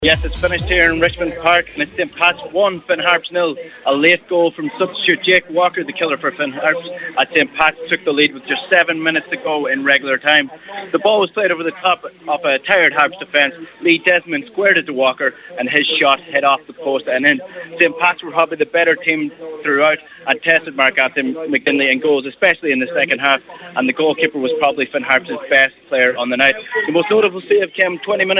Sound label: HARPS